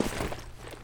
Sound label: footsteps, mud; player